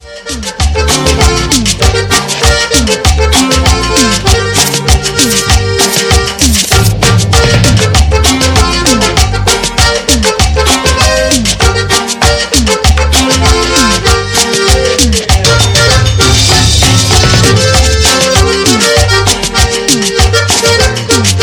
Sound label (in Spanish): CUMBIA